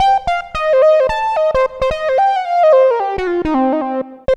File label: Funky Riff; Riffs & Solos; Dr. Fink's Funk Factory